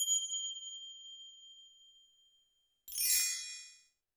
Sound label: percussion, Roland TD-20